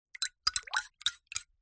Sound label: spoon stir hot water tea or coffee in mug; food drink; zapsplat